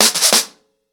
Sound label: FX 90 BPM, acoustic drums; The Roots Drumloops